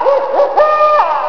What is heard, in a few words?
OWL, ANIMALS